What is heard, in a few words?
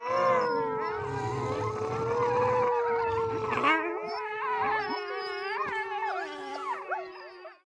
Pack of wolves howling and growling